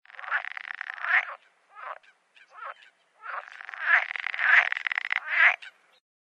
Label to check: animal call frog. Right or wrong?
Right